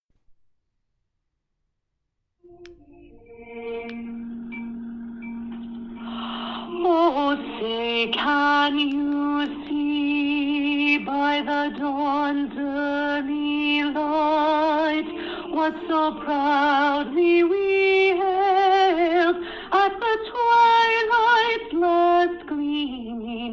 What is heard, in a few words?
alto; ssb